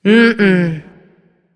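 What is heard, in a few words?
disagree, female; smite; voicepack; hera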